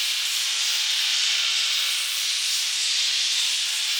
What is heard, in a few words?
Noise Loop, sparse soundscape